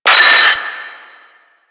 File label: Screen Flicker; Warframe; Duviri; Easter Eggs